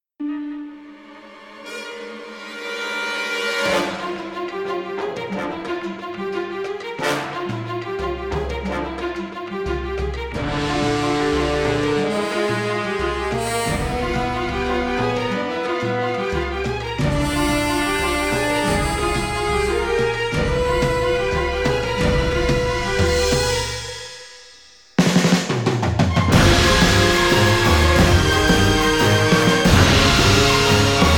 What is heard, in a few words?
Orchestral, Music